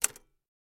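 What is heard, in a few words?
Typewriter Single Key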